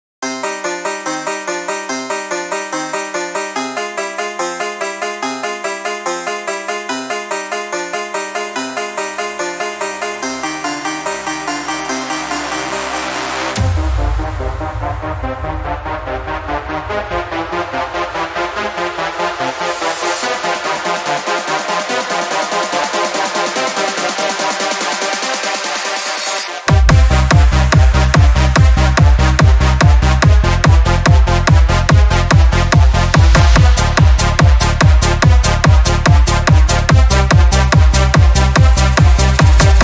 bach prelude and fugue techno mix

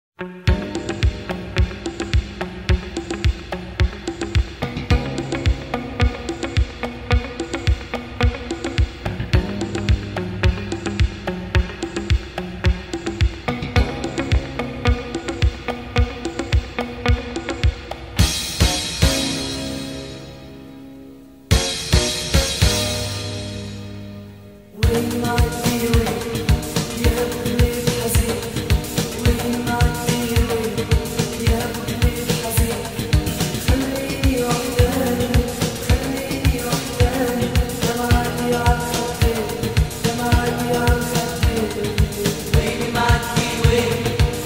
arabic music

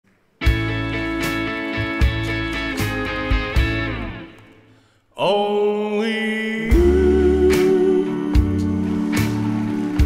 live at the grand organ hall

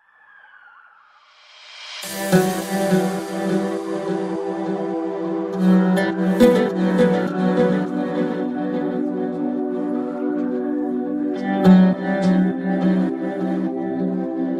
deep house remix

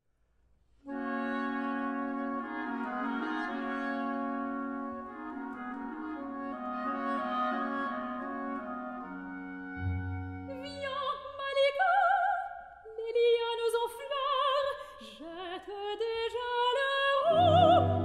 duo des fleurs flower duet; delibes

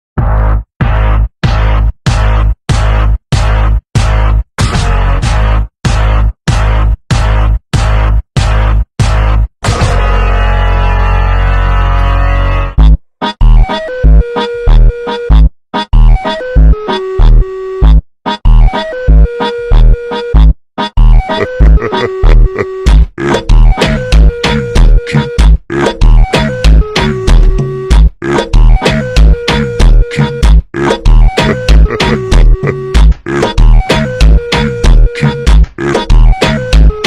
brazilian funk slowed reverb

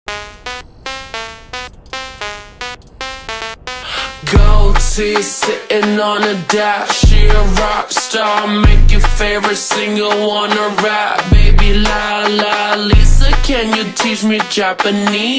slowed reverb

